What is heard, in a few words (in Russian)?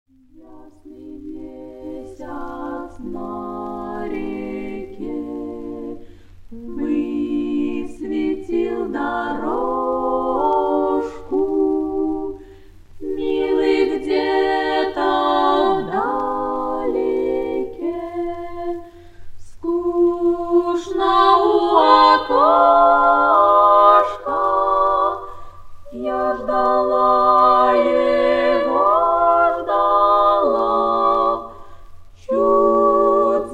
vokalnyiy kvartet